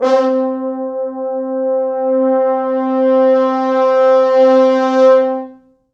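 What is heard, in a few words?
4 French Horns, Horns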